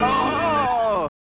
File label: Eaten by Creepy Monster